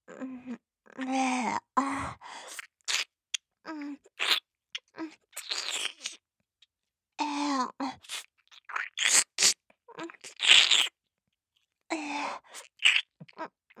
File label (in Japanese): 耳舐め